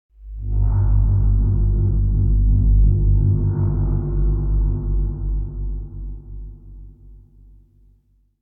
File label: Bass Horn Reminder - Low, Semitone Disharmonious; Hollywood Edge; sound designer's toolkit v2